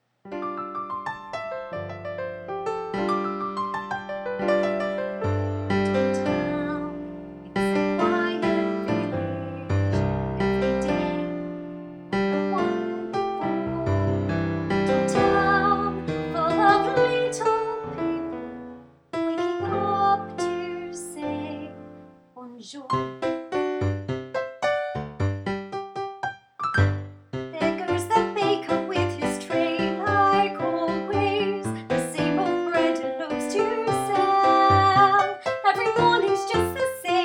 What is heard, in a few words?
vocal voices